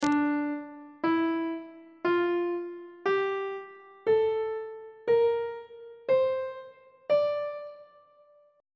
e double flat minor scale note positions on treble clef